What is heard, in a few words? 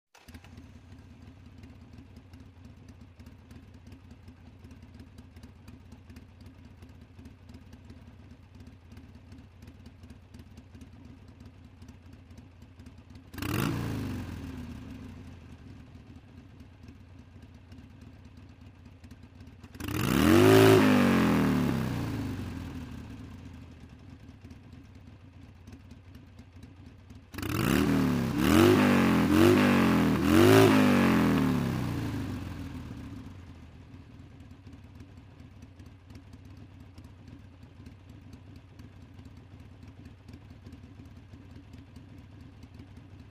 Motorcycle starts, regassing, jamming